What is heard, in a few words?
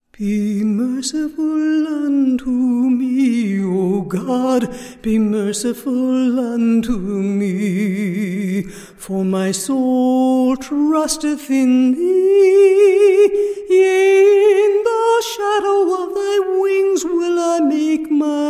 a capella scripture song